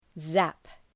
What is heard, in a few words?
zap